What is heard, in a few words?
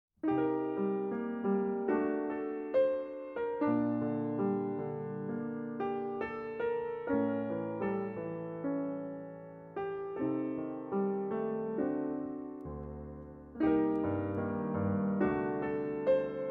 Piano Solo; muzyka k filmam; pobochnaja tema